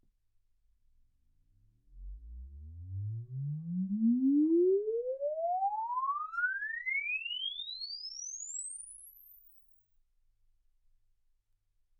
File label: exp swept sinus 10Hz 24kHz